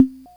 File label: perc, opl3; M8